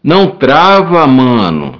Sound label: berimbau